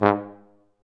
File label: F TUBA, Solo Brass, Tuba stacc